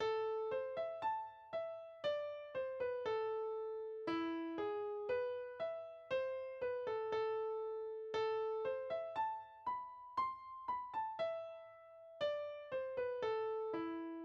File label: clockwork